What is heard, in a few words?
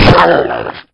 zombie grunt pain